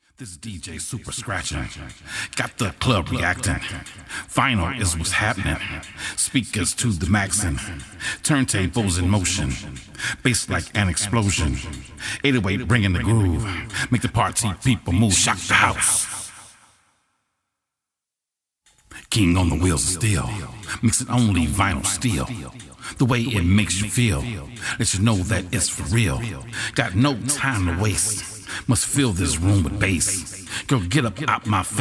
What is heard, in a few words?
Acappella